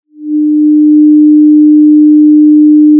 pop, Sound of a cruise ship horn

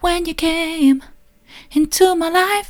soft, vocal

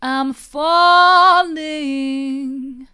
i'm falling mid, vocal

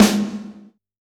Snr, drum

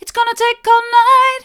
its gonna take all night mid, vocal

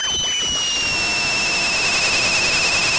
pop, Sound of a cat meowing

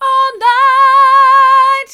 all night hard, vocal

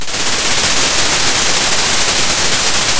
pop, Sound of a thunderstorm

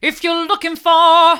hard, vocal

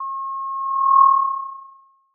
Sound of an owl

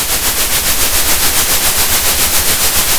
pop, Sound of a train

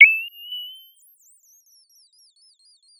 Sound of a bird tweeting nicely

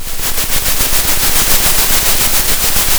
center, Sound of a train

